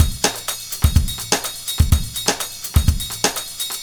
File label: Jazz Break 125 bpm, drums; Loops; Ableton Live 12 Lite; Core Library